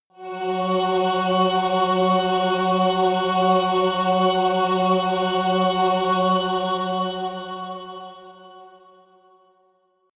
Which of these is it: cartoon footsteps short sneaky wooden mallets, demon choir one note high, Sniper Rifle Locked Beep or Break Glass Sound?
demon choir one note high